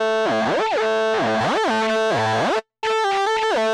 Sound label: end synth riff